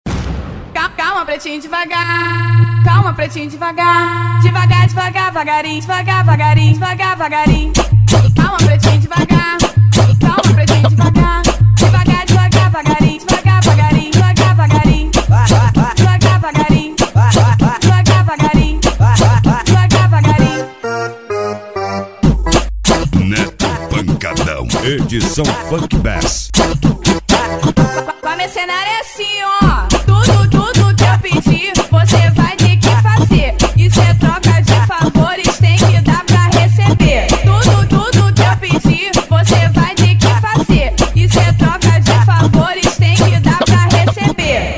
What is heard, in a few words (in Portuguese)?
funk bass remember